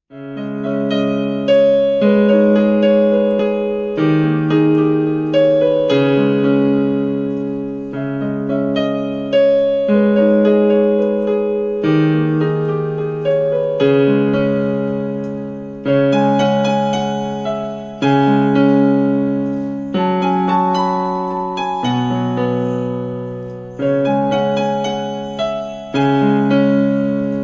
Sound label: sad bgm keyboard cover telugu movie; anandam